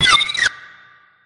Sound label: cry